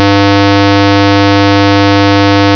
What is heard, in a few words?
sine